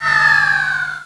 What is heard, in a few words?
Bomb; Soldiers 2